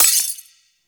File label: Sword Enemy Frozen; Weapons; Melee; Warframe; Orokin Weave Sword; Weave Boss